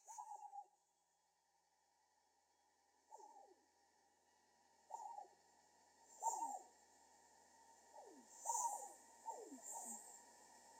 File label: Squirrel, Garden